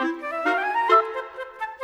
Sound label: Winds, Orchestral